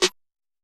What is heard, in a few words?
Snare